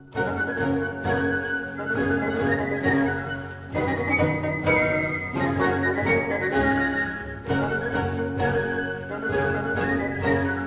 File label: Barockorchester, Tambourin; Hippolyte et Aricie